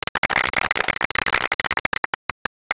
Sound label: clap, Crowd German